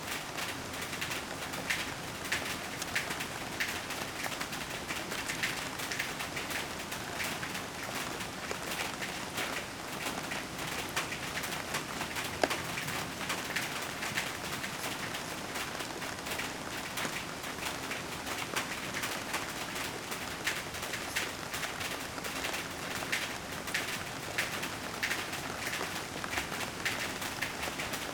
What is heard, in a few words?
Rain Sounds, Sporadic Splashes